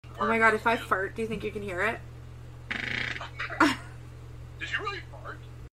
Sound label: farts; life with ankylosing spondylitis